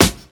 00s hip hop snare f key, snare drum sound tuned to the F note; snare drum samples